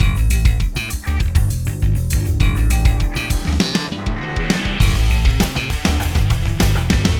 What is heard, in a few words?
funk